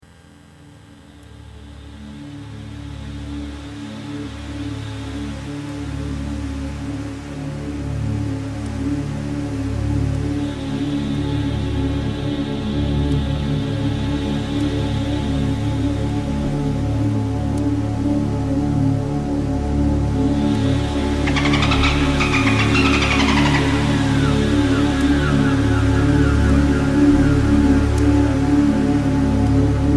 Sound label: dolphins sleep; 528 Hz